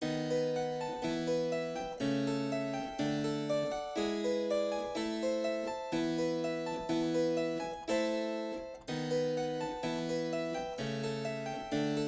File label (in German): Cembalokonzert